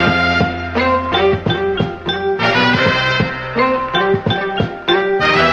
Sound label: horns, phonky loops